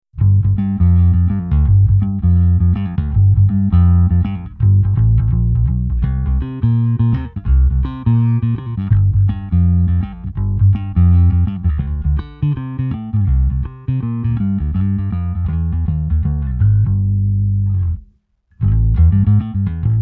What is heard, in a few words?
bassman; zoom b3